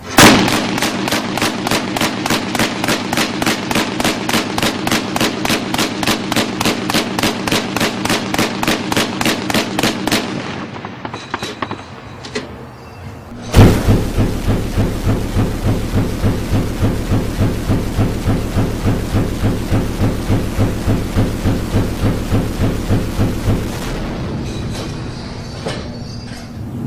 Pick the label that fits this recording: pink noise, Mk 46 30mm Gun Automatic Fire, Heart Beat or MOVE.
Mk 46 30mm Gun Automatic Fire